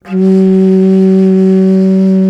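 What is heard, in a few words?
bass bansuri, World Winds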